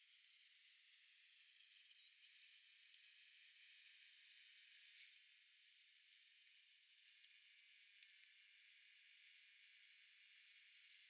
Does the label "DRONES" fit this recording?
Yes